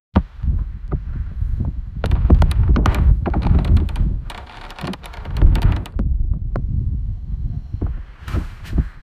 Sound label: sound of strong winds; slam